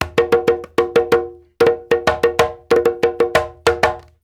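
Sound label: break drum, Reggae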